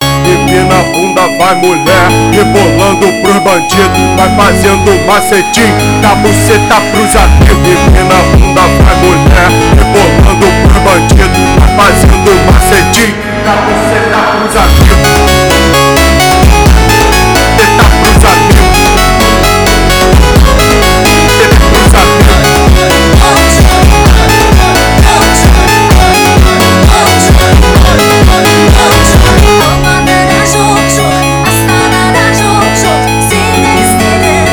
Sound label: funk